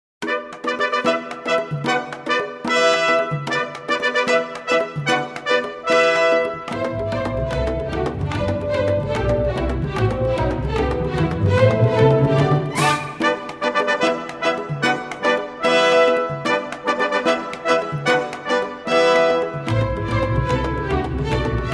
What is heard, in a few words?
Bata March